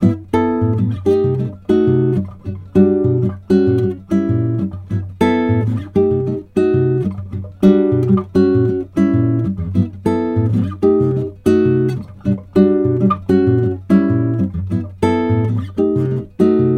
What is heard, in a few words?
Bossa Dry; se electronics vr2; میکروفون استودیویی و حرفه ای اس ای الکترونیکس VR2